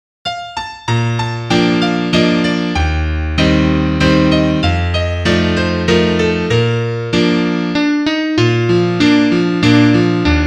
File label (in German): Klavier Solo, trompete; Kommt ein Vogel geflogen